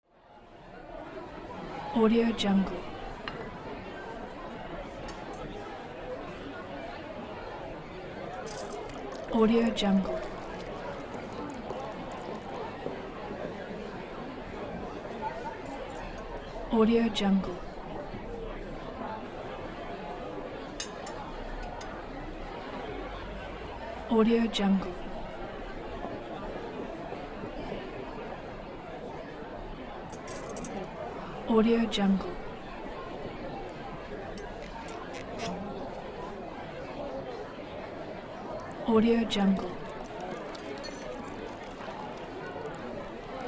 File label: restaurant ambience